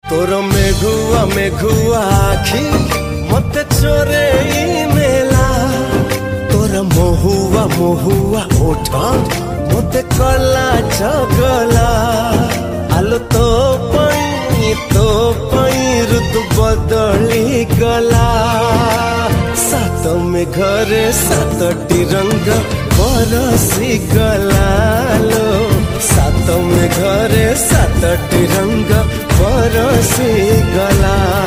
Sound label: Odia Song